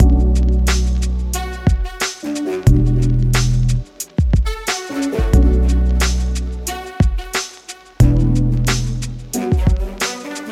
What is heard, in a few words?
sega 90 bpm b min; monosounds